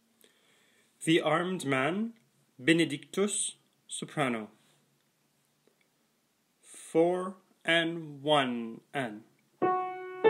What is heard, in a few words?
Soprano Piano